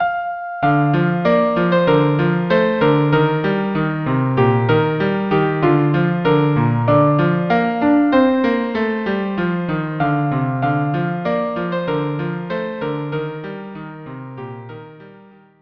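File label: violin cello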